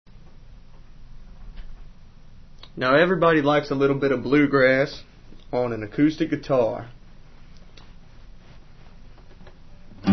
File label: bluegrass